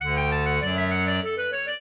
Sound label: clarinet; Mozart